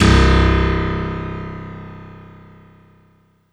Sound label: HARPSY C2, Harpsichord; Orchestral; FL Keys